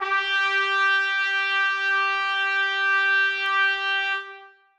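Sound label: trumpets sustain g4; Sonatina Symphonic Orchestra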